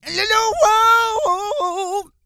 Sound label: SING, Vocal Planet R&B & Dance; ILIO